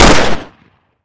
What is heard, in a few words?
fiveseven